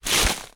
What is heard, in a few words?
foley footstep path deep gravel